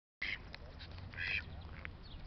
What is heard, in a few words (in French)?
canard